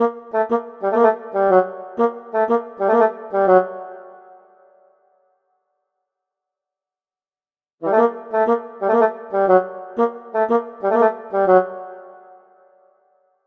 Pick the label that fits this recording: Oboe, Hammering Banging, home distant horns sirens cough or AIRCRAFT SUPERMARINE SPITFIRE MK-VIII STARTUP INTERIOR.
Oboe